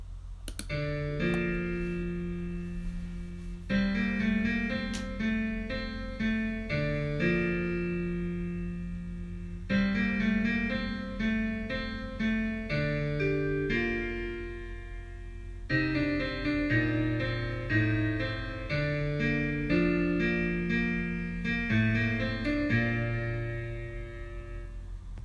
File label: INSTRUMENTAL